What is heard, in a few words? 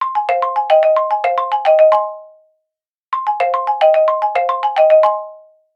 Drama, ringtones; China Telecom